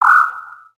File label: plains bird